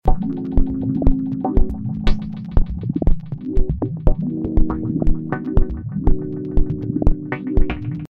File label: Broken Loop